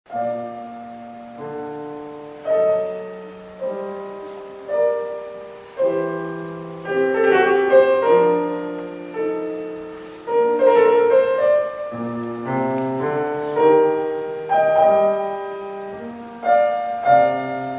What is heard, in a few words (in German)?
Klavier - Quartett KV 478 - Andante; Mozart